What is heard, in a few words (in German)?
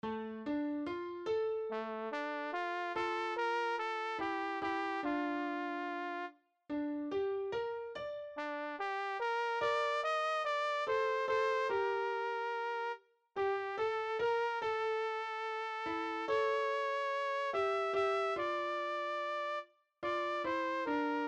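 oberstimme, Übehilfen für das Erlernen von Liedern; ref gesangbuch; rg 168 hewenu schalom alechaem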